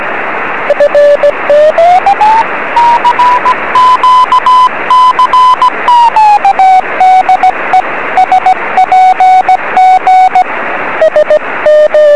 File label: 3cm cw; sm7lcb